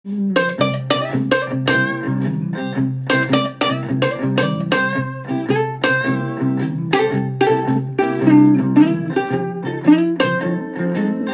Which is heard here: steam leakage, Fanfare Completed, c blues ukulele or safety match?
c blues ukulele